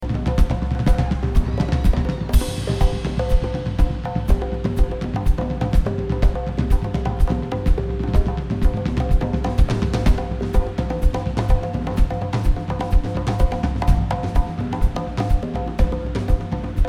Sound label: Drums